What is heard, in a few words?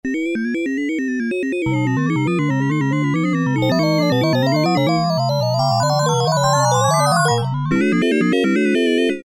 Hold & Sample; E-mu Proteus-1; Presets